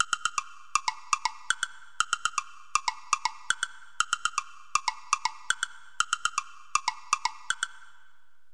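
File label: woodblock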